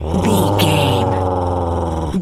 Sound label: wolf growl